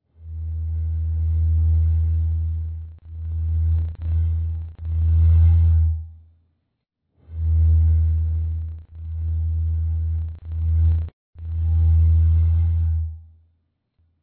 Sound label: Foley; John Go Outside title screen